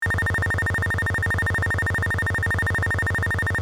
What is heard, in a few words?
Futuristic alarm or warning loopable